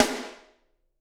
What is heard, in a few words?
SD, Drums; Bob Clearmountain Drums I